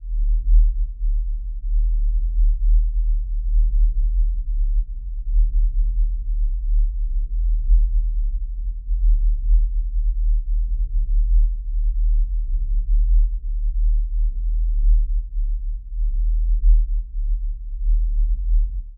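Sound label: spaceship rumble; spacecraft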